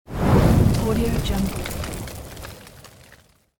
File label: fire whoosh sound